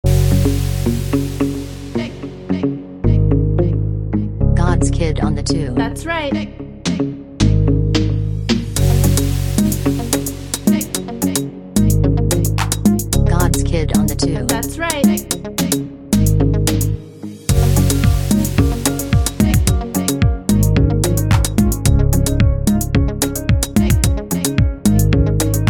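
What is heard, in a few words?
Afrobeat instrumental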